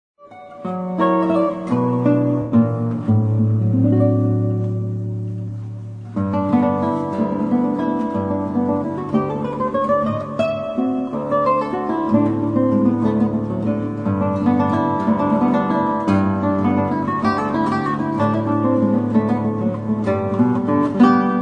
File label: Valse No. 4 Op. 8